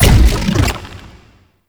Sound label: Octopede Lash Limbs Beam Stop; Warframe; ManInTheWall